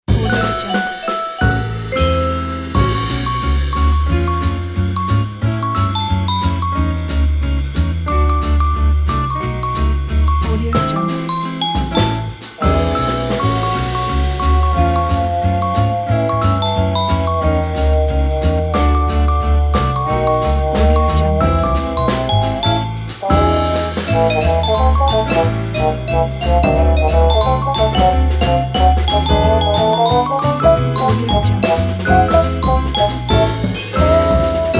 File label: jingle bells swing; mzk music